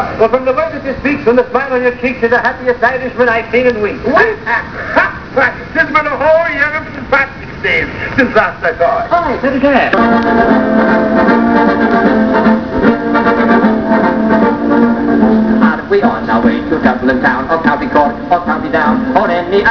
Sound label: jig